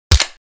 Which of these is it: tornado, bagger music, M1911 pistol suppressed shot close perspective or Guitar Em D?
M1911 pistol suppressed shot close perspective